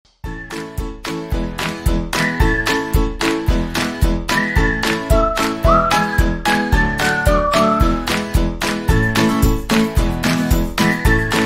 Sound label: cooking music celebration background music